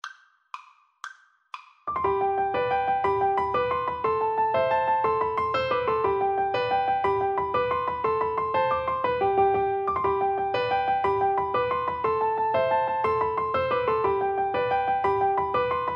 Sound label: irish jig medley, Piano Duet